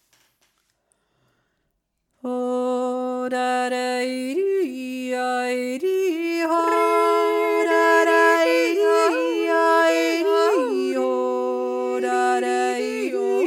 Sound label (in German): rabrett jodler